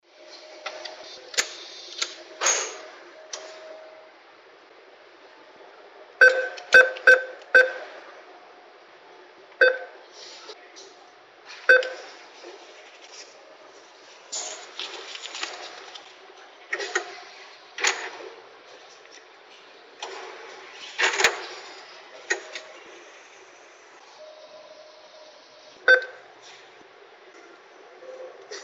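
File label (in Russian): звук пополнения карты через банкомат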